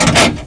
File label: 2ND GEAR; PC Gamer 4.2; mtm2demo